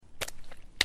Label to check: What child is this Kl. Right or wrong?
Wrong